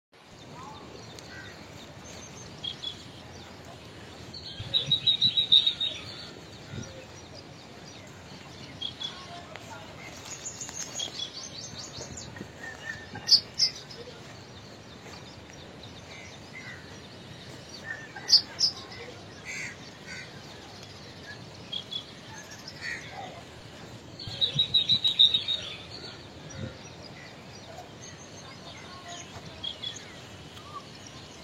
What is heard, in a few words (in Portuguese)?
canto do bico de chumbo indiano